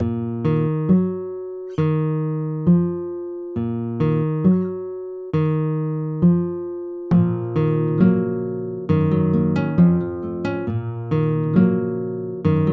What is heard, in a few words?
Guitar